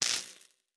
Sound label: Spark, Electric; Sci-Fi Sounds